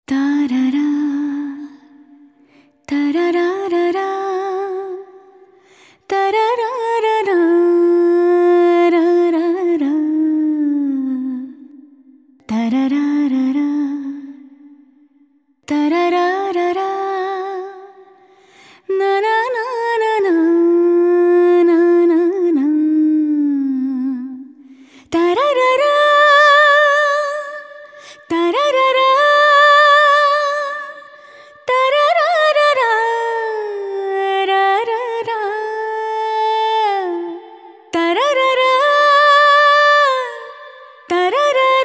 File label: Humming; Malayalam